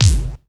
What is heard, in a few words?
DX MIX BD, DRUM; AKAI S6000 CD-ROM - Volume 1